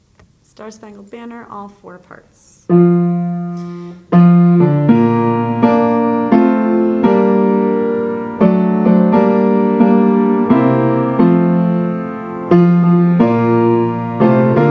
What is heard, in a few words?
All Parts SSB piano